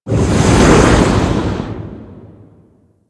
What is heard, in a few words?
Stalker Assassin Spawn In; Warframe; Warframes